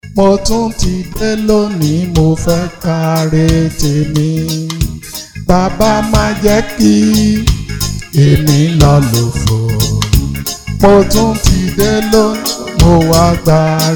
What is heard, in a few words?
hymn also chorus